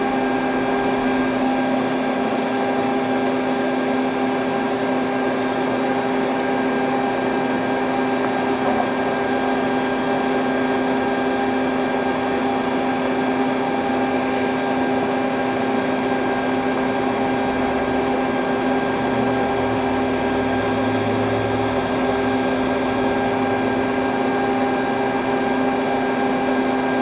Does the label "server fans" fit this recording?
Yes